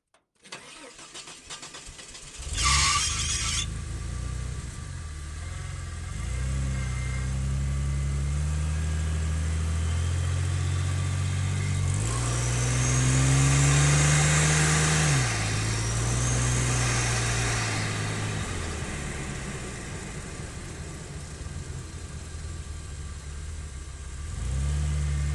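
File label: jeep wrangler onboard start drive gearshifts high stop engine left; dpa4061; Sonniss GDC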